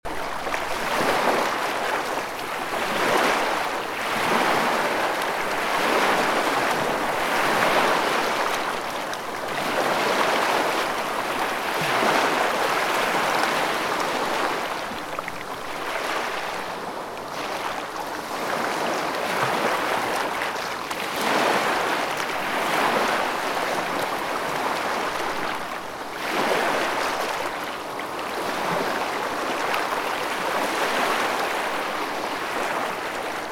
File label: ocean waves white noise